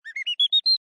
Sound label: Cartoon Rising Chirps, Tweeting bird, takeoff, launch chirping; Comedy/Cartoon; Funny Sounds